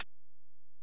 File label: EPerc, Alesis DM-PRO; 300 Drum Machines